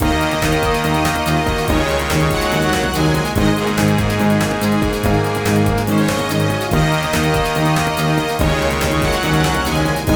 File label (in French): Maintheme Orchestre Et Rythme; Asterix Obelix XXL 2